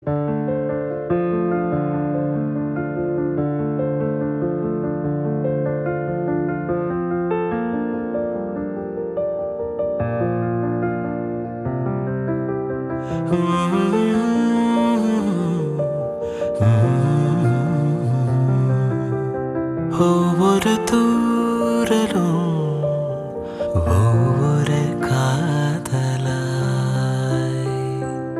Bgm Ringtone

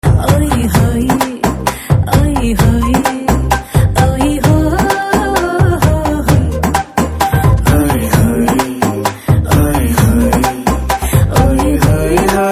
BGM Ringtone; Kondapolam

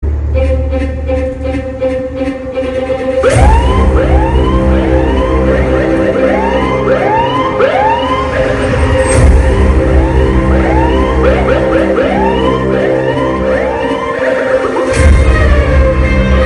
Police Siren BGM Ringtone

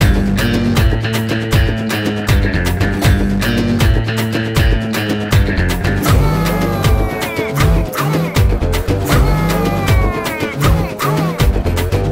Vroom Vroom BG Tone

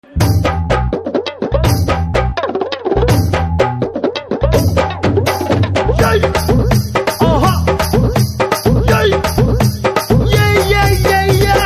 BG Drum Beats

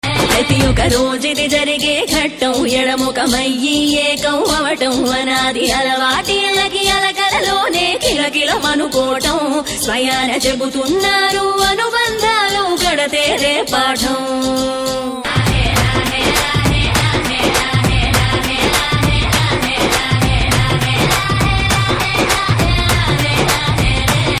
Ringtone

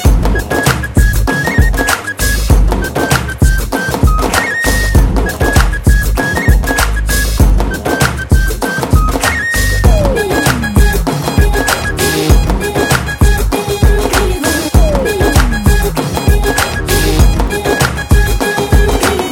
Flute Tone

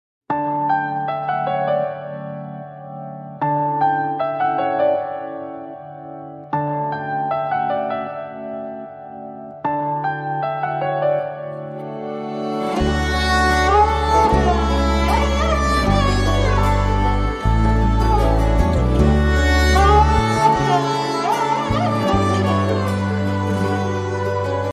Piano

